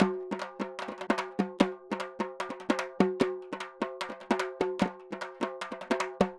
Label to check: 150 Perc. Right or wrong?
Right